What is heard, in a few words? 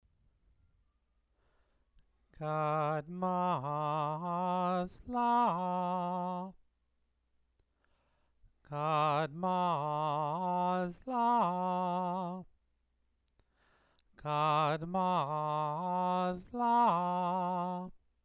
Megilat Esther exercise